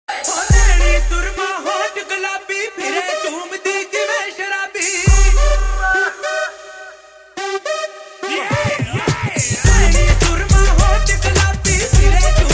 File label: Is Calling Acoustic